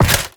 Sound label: metalarmour drop; ui; inventory